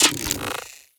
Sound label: Objects Items Interactions Menus